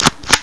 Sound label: magnum reloading